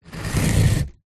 foley knife serrated cut polystyrene

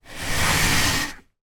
stool drag carpet

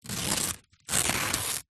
household baking grease proof paper rip

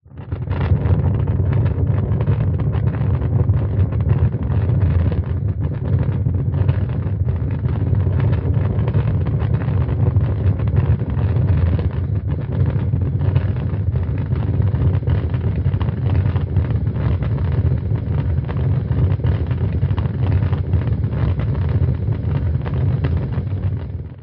nature fire flames large burn movement

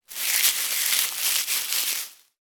foley tinsel garland movement

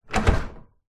household garage door wooden closed impact rattle; zapsplat

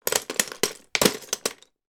toy lego blocks dropped med slow in full box; sound effects one

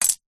cutlery plastic knife set down table; household

